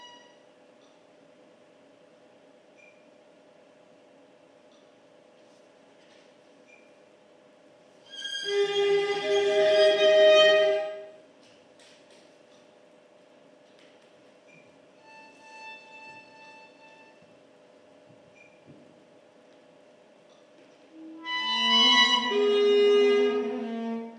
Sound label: apple orchestra